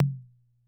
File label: Tom, rhythm 55